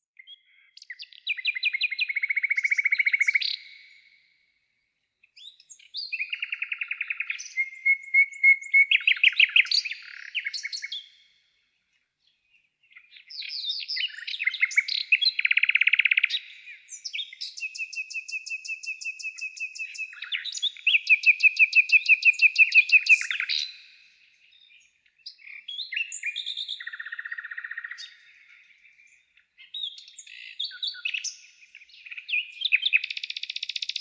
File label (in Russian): zvuki prirody nochnyye solov inyye treli; vesennii sbornik